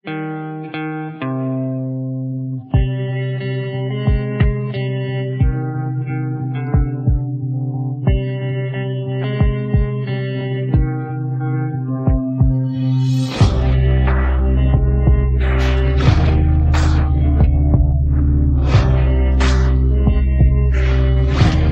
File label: instrumental